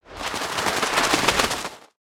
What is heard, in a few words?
Owl Flutter; Valiant Summoner